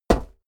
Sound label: step walk metal solid; schritte